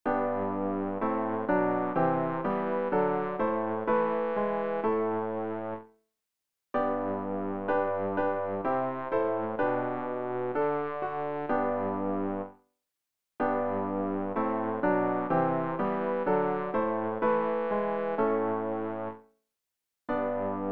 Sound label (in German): bass, Übehilfen; Jauchzt, alle Völker, preiset alle; Ref. Gesangbuch